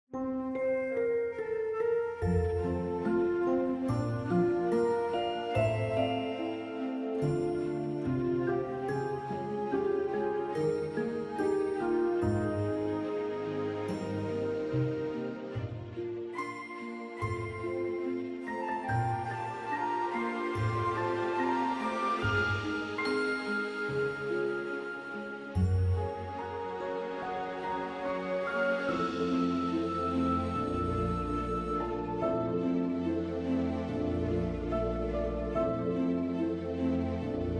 Orchestral Arrangement; Animal Crossing： New Leaf

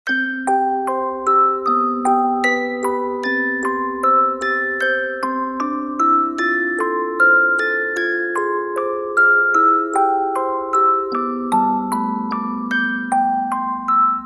Piano Practice, Music Box; The Legend of Zelda： Majora's Mask

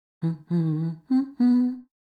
Humming; Link; The Legend of Zelda Tears of the Kingdom

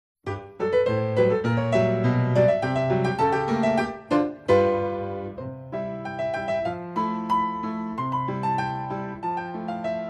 Ragtime, Piano Version

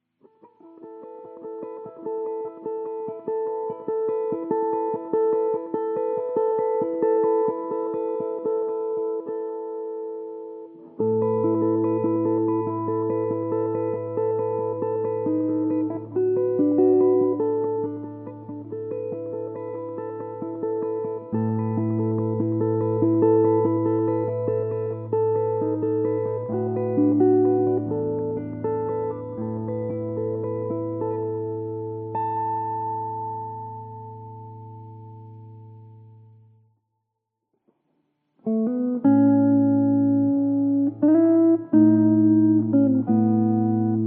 Solo Guitar Cover

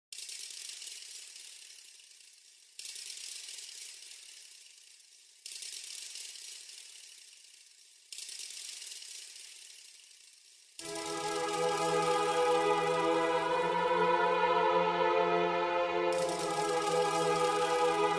Orchestra; Forest Temple; The Legend of Zelda‛： Ocarina of Time

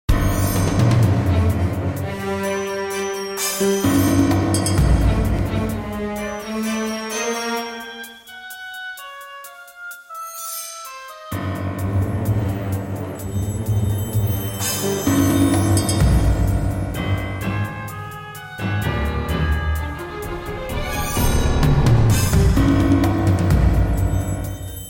Orchestral Remix; Pokemon DPPt

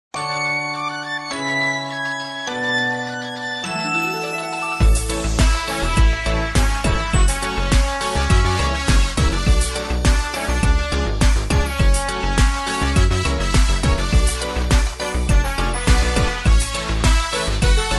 Music; Rhythm Heaven Megamix

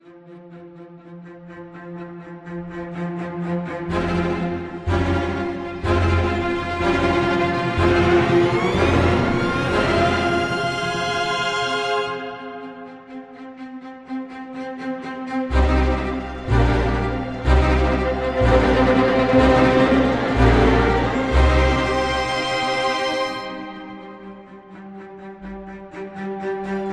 restrained icy combat; Tabletop RPG Music

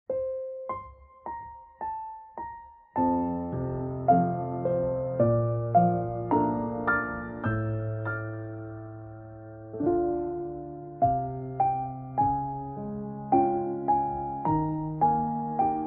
Relaxing Piano cover; ANIMAL CROSSING‛： New Leaf

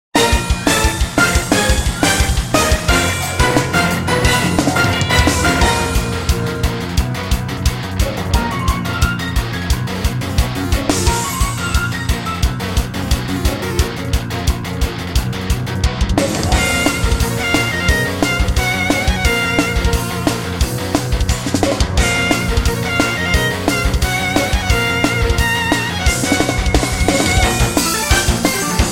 Arrangement; Pokémon Sword and Shield

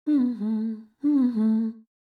Humming

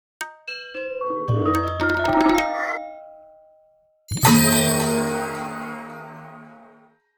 Zonai Device Dispenser; The Legend of Zelda Tears of the Kingdom

